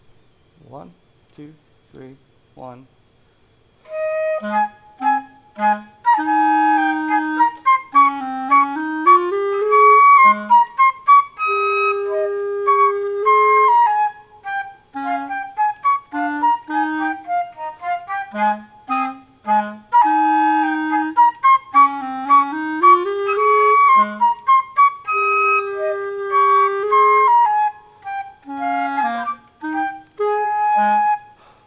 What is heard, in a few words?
fl cl duet minuetto